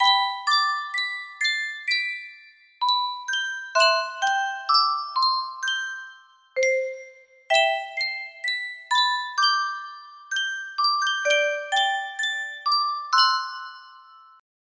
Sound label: music box, music box melody; ffxiv